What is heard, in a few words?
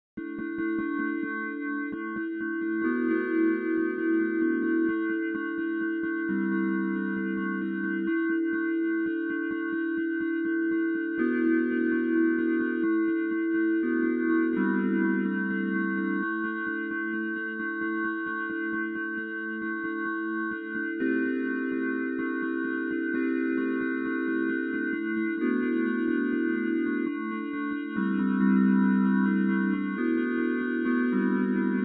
bell melody